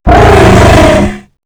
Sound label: thumper chase; lethalcompany; zerosiki; slender